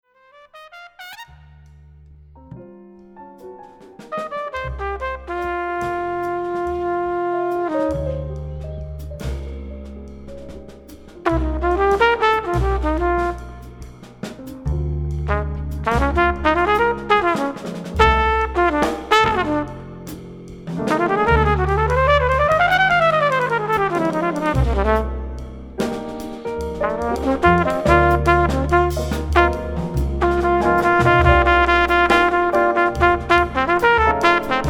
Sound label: Jazz Trumpet